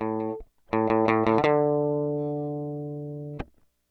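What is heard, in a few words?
guitar distortion; gtr dist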